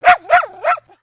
Pet Dog Small Happy; creatures pets; Lego

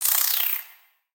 Spider Idle; creatures mechanical; Lego

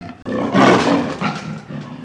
Jungle Roars; gnarled forest

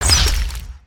Fish Crossbow Fire; weapons; aronwk collection; ndaudio

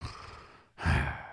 Sleep; creatures organic

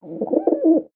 Monument Bird Coo; creatures organic

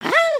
raincloud; emotes

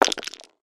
DICE

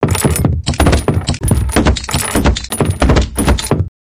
Chest Jingle; foley

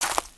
Footstep Leaves; creatures pets

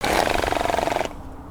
horse snort

